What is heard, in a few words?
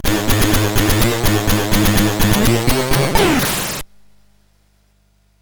Game Music, sid CSG8580R5